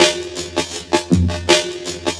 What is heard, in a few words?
Funki Cut, Jungle, Drum Loops 163.5; Zero-G Jungle Warfare 1, 2 & 3